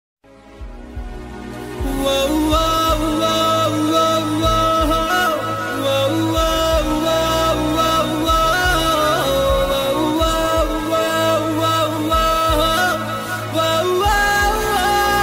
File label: love bgm ringtone